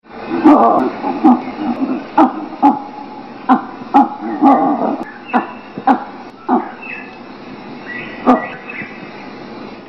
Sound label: kipunji honk bark